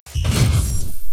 Block Start

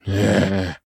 Spa Bugs Startle; Hollow Knight

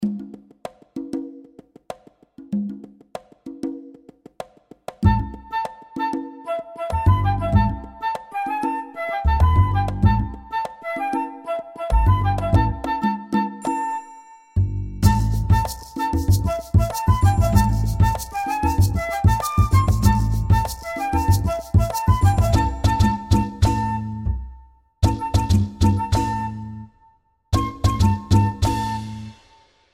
Tribal; GoAnimate

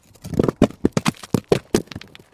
rock

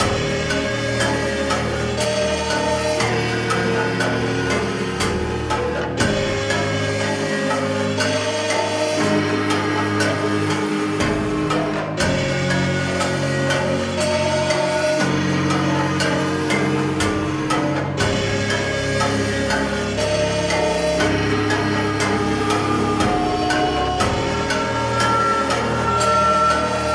music start; Babylon's Fall

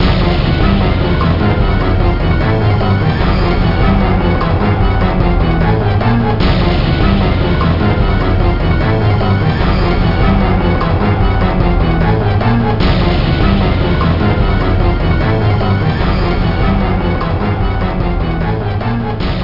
Suspense; Kaidan Restaurant Ura Menu 100 Sen

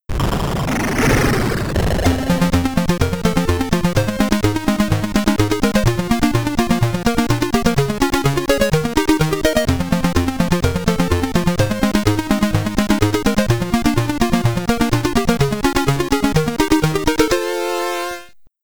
Stage Clear (SID Stereo), commodore 64

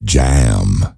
Jam; candy crush jelly saga gamerip

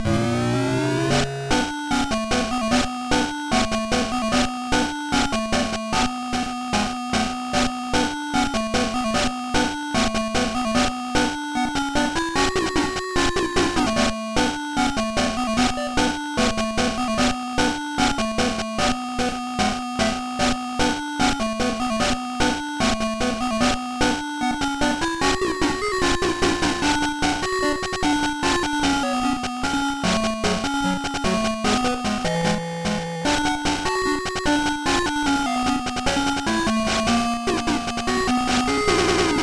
Cave; Phantasy Star Collection; GBA

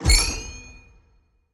Store Confirm Buy

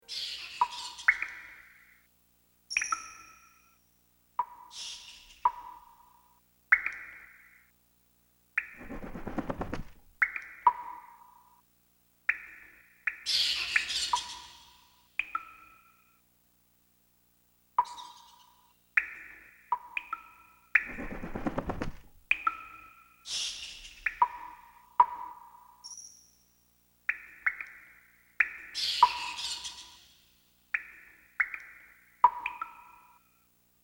cave ambience; gc; gamerip; star fox adventures

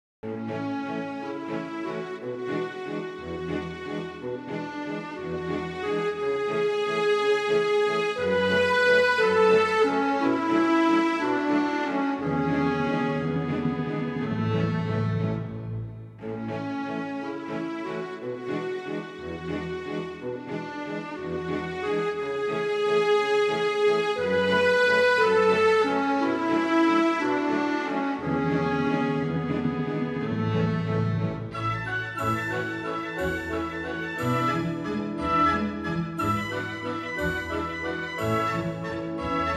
Skaters' Waltz Record

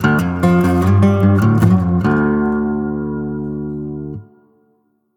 complete sting

